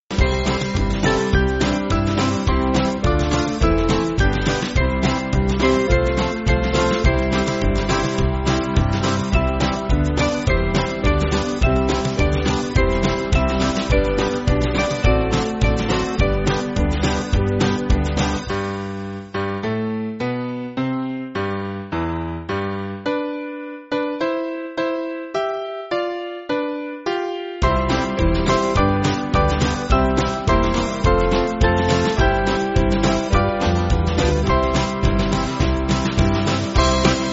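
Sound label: Band, Backing Files for Churches; Festival Hymns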